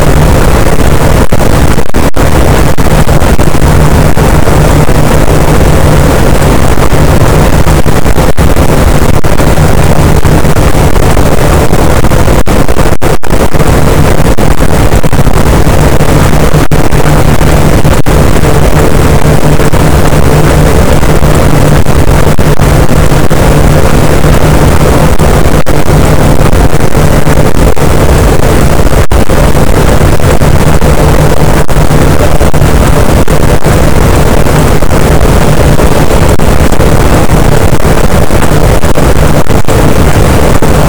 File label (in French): cachalot positif, boat, Physeter Macrocephalus